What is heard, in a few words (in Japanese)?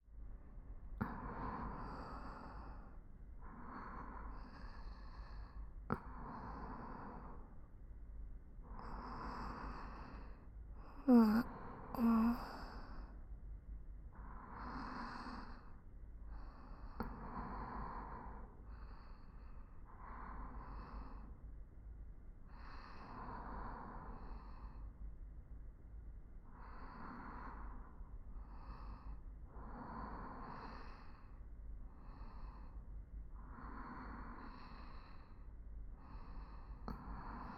寝息トラック, ASMR